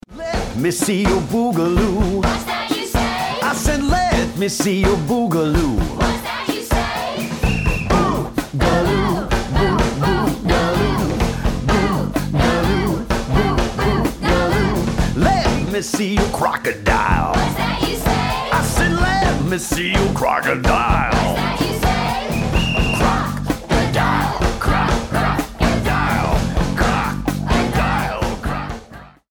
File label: boogaloo song